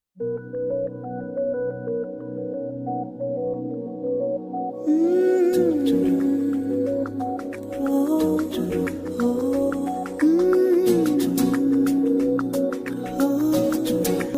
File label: telugu christian bgm ringtone; christian telugu songs; jesus songs